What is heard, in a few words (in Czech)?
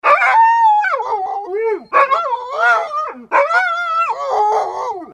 pes